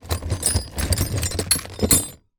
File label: industrial drill bits rummage plastic box; zapsplat